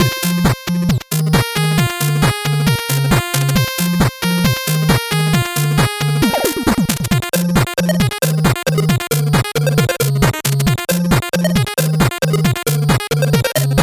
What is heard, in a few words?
8bit bgm normal